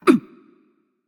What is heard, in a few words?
grunt hit; cliodhna